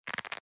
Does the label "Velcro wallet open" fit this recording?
Yes